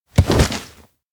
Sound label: kneel ground; ukishima; anemoi パッケージ版